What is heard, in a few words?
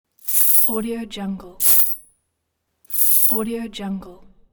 coins jingle